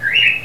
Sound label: tri whistle lofi